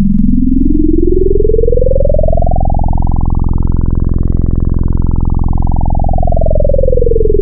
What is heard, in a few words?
FM, Wavetables